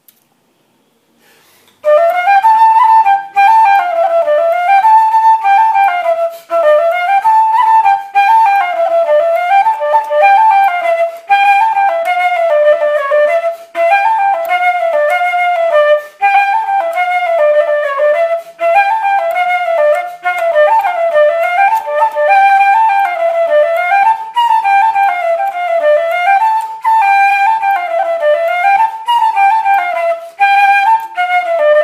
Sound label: celtic tunes